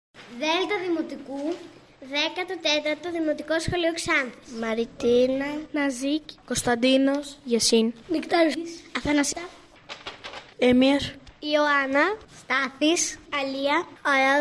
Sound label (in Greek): ΚΑΛΑΝΤΑ ΜΑΚΕΔΟΝΙΑΣ; Δ ΔΗΜΟΤΙΚΟΥ; 14 ΔΗΜΟΤΙΚΟ